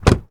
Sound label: Truck Dodge Ram Door Close; Trucks